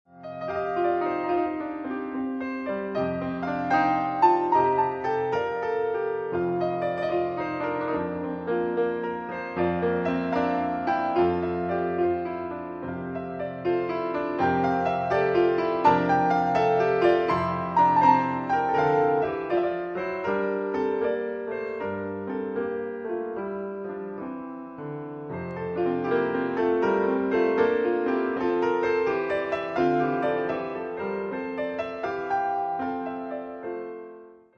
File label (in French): berceuse, piano 4 mains